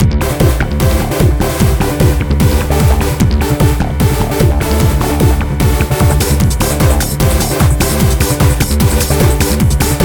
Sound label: Shooting Loop; The Legend of Gwen; Windows